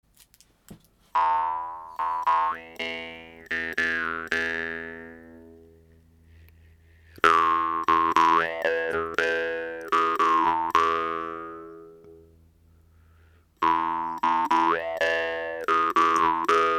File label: DRYMBA